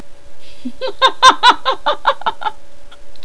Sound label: laughter